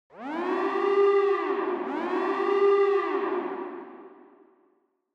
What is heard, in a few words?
Warning Siren Reverb Fast Short